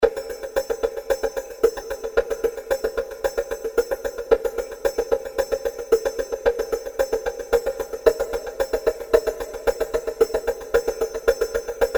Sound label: Creepy Rhythm